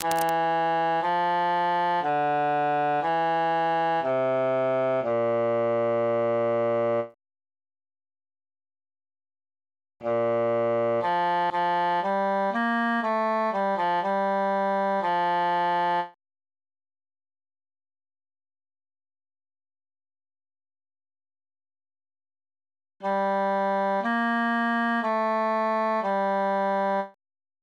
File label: bassus solo